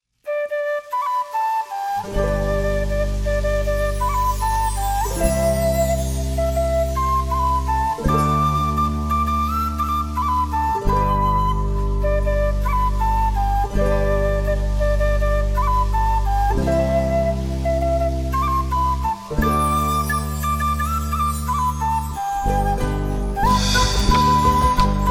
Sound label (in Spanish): campanas navidenas